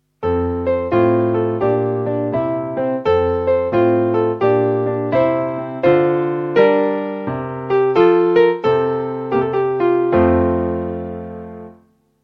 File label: piano